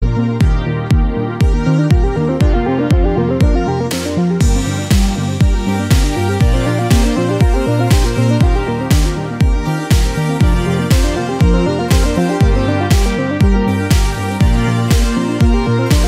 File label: Kids Remix